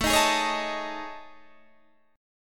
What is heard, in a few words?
A Major 7th Flat 5th, down Guitar DADGAD